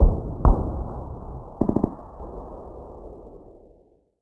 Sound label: cannonade; World of Tanks Blitz